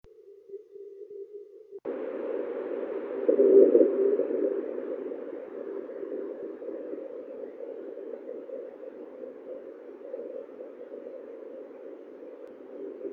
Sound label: lightning scatter nulled; wa1zms